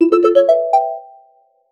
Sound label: announcement sound effect